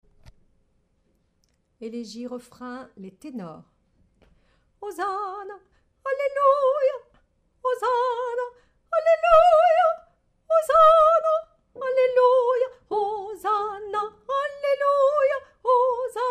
Tenor

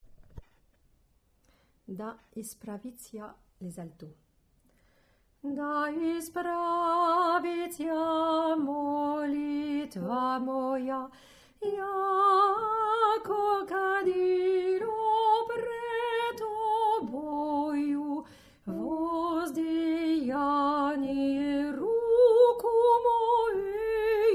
Alto; seconde chanson russe

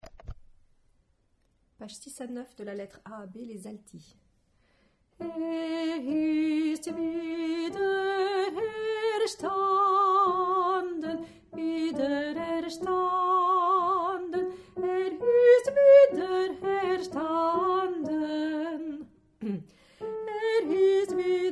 Alto

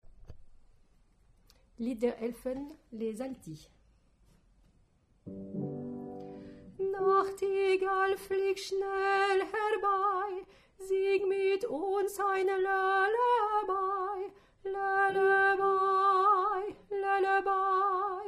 Alto

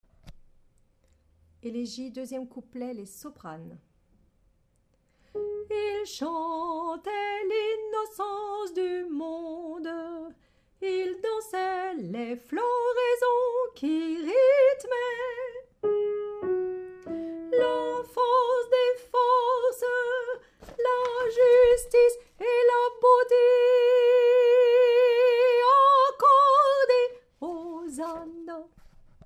Soprano

